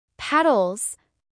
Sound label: paddles